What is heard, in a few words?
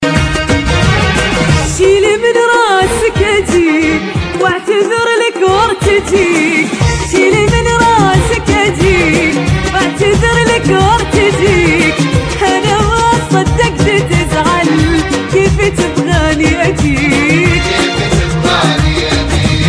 Arab Song